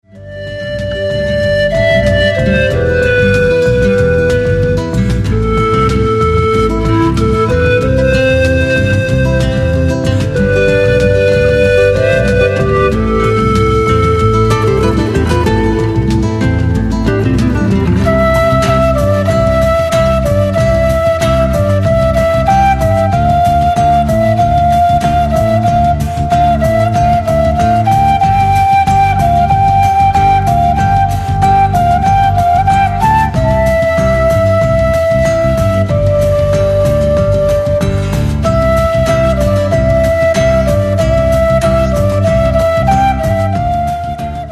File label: song of ocarina, melodien der panfloete